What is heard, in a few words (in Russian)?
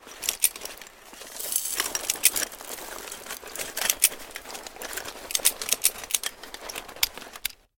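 шуршание военной амуниции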